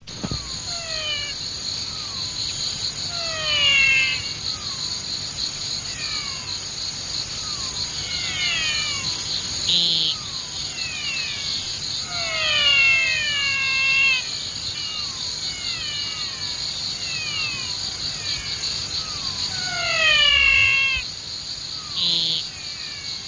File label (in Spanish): ranas; Guarani